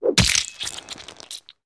Beheading Axe, whooshes & swishes

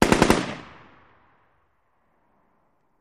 Machine Gun Sngl Burst; bursts

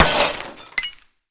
Glass Impacts Debris; windows & mirrors

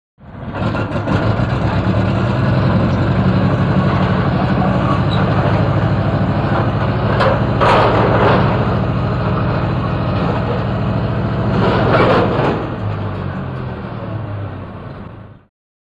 Crane Under Load Revs

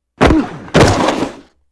Hockey Player Hit

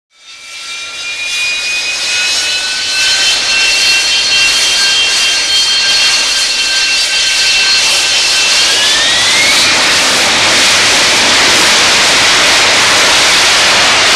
Jet Engine Idle Harri, av-8b harrier

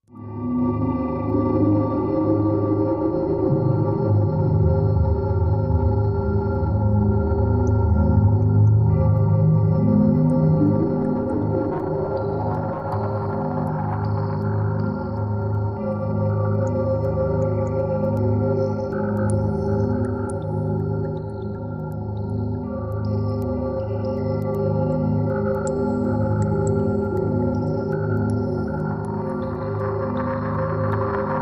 Meditation Low Space, sci-fi ambience

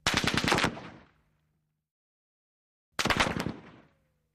AK47 Short Burst Impa

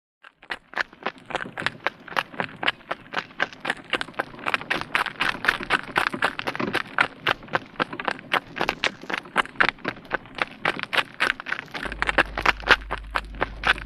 Away Pony Cart Away To, carriages

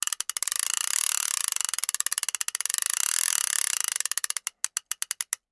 fishing reel

